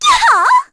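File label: Vox Casting, Korean; Erze; kingsraid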